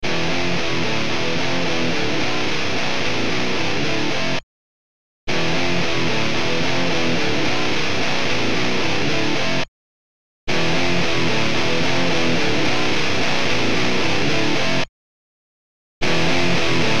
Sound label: Guitar